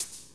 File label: Change Section